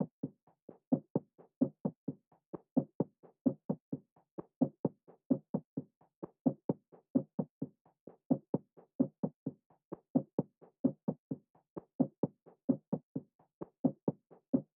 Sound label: drum loop 130 BPM percussion; Loop Stems; Cymatics; DOPE DRUMS VOL. 1; Hunted